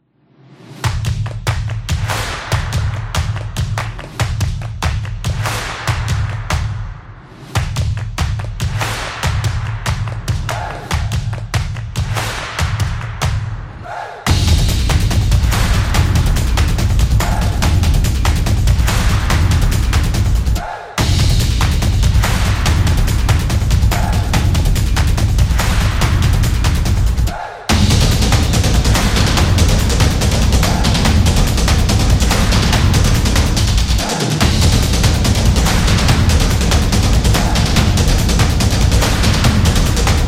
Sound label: This Is Drum Action